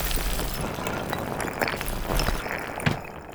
ice pieces; snow

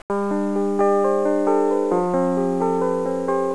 SOFT PIANO, short piano recording

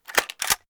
rifle reload

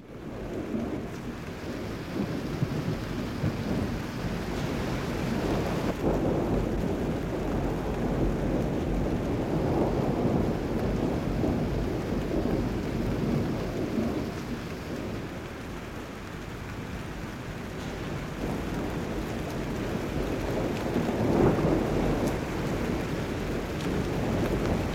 rain wind

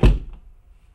thump; attack

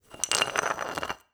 rock ice slide

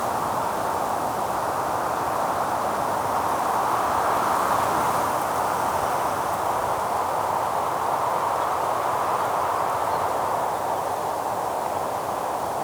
forest wind

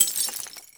glass drop, glass bottle drop

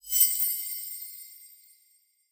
bell chime; belfry

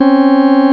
BEEP

